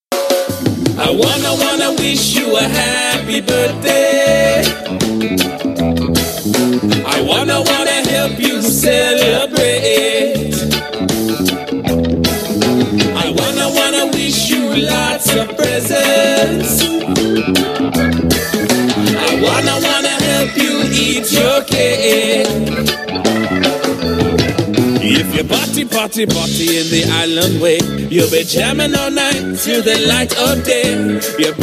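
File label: Reggae Happy Birthday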